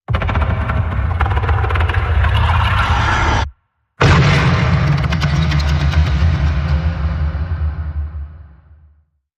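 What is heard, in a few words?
Creature Dragon Disturbed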